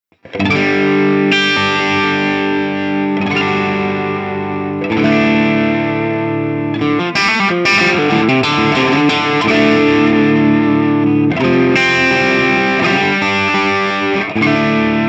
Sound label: Valeton GP 200JR MARSHALL 800 75 CAB